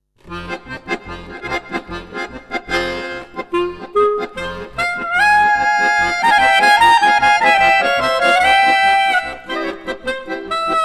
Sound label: happy nigun, akkordeon; musik mit akkordeon